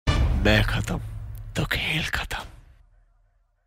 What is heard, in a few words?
Massege Tone